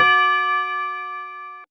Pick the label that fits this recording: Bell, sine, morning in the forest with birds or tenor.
Bell